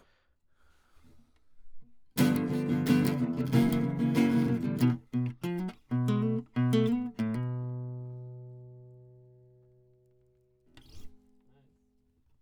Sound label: Classic Guitar